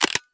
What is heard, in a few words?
stockslide; gmod; arccw uc commons attachments